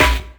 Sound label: dirty snare, electro; Snares